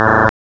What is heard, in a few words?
BASS WEEP; CMI Sound Library Rev 1.3